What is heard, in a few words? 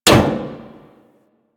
Ship Hit Effects; Warframe; DE; Vehicles; RailJack; RailJackHitEffects